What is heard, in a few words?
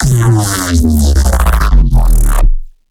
C wobble fall, bass